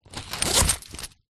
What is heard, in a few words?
foley money pouch fabric coins zipper